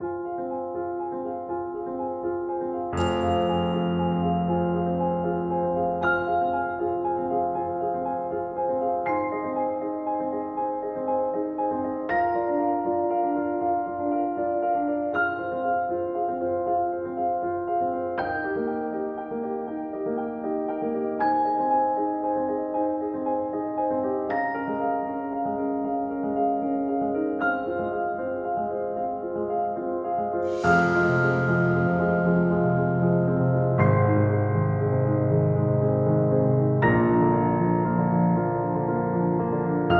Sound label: menu music piano loop; EvoCreo; Android game music